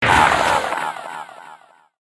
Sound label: skelly death